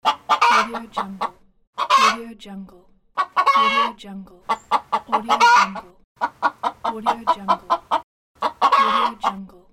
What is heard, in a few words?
chicken